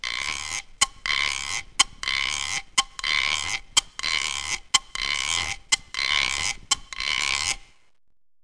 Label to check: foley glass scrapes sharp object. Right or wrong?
Wrong